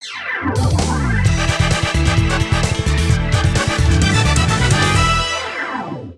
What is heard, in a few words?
Victory BGM; puzzle arena toshinden 1997 playstation gamerip